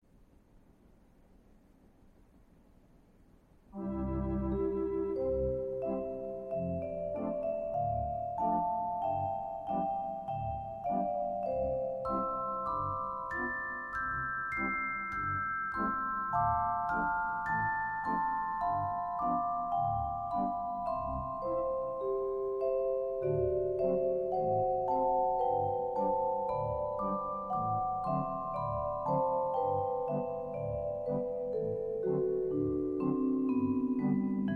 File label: Vibes Sleigh Chimes; p450 ranks